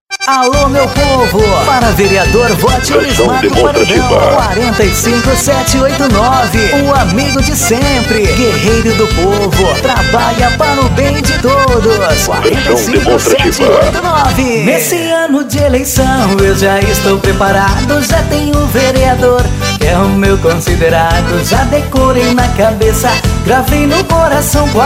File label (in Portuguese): jingle para vereador sertanejo universitario; Politca 2020; Jingles Dingo Eleitoral